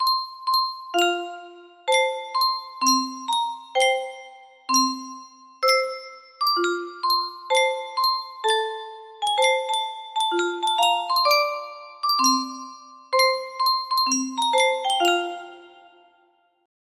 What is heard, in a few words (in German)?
yunsheng spieluhr